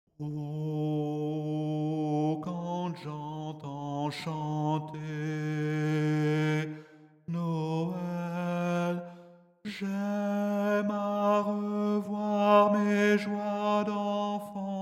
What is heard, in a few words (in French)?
noel alto